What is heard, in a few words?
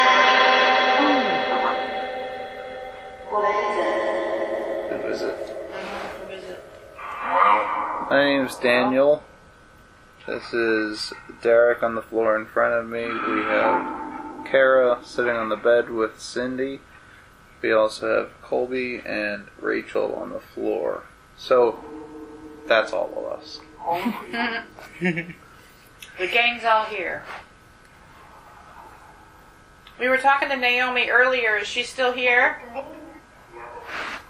Phasma; Bedroom; private residence freedom road apartment investigation return